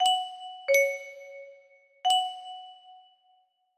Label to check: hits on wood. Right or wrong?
Wrong